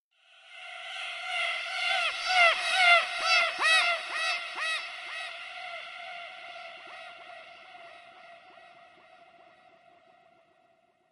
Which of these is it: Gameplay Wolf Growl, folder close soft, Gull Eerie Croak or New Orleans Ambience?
Gull Eerie Croak